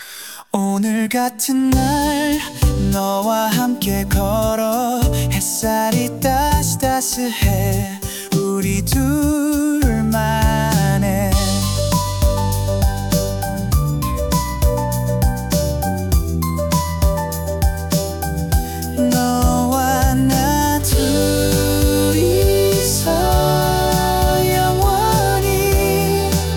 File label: easy pop M; acestep